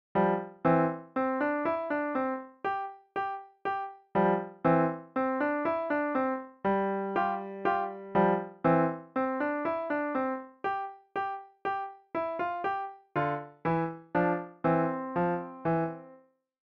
piano

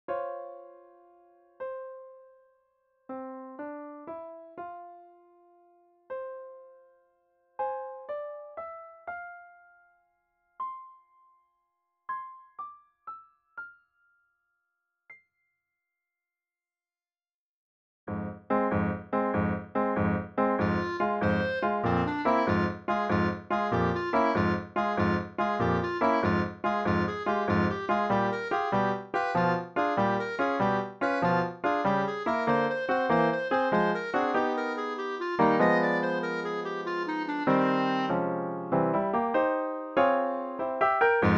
sorcerers apprentice clarinet

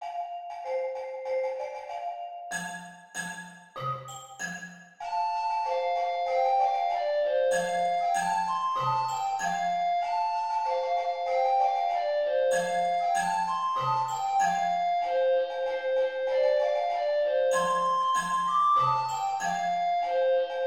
orff

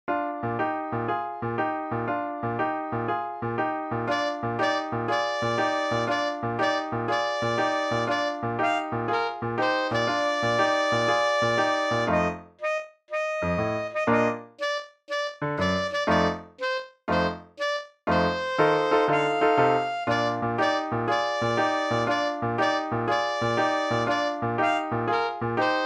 boogie alto sax

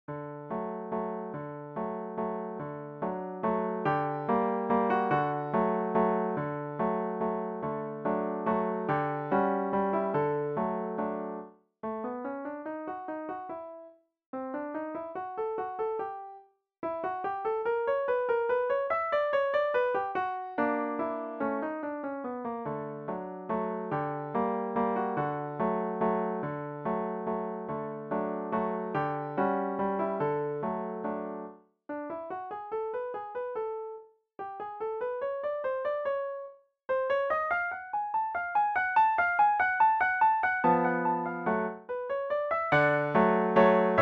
piano solo

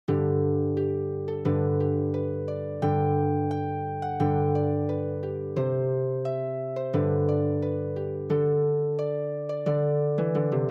harp

